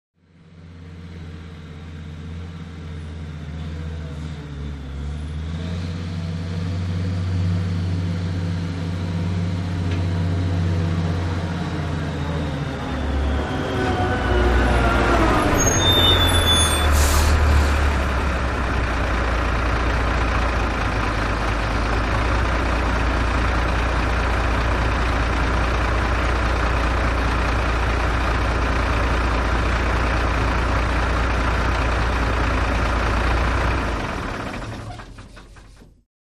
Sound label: Earth Mover; Earth Mover Up, Stop, Idles And Switch Off